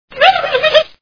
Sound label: woob; three stooges